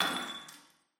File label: glass break; Half Life Alyx; oneshot